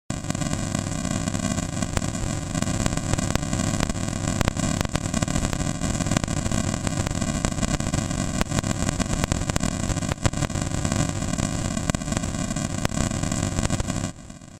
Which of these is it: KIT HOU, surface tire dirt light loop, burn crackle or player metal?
burn crackle